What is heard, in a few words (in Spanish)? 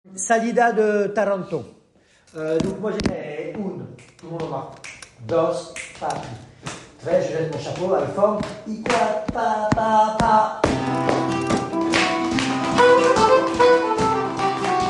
salida taranto en tangos; 1 9 – salida taranto x tango